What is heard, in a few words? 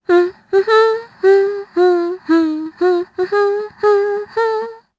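Vox Hum, Japanese; Laudia; kingsraid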